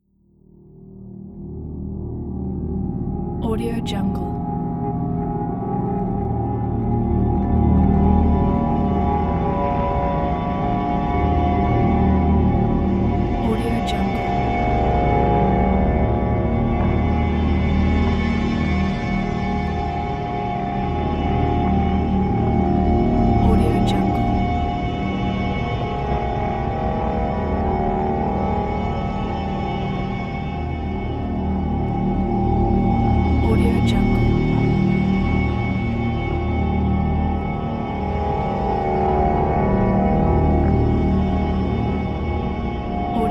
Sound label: unearthly background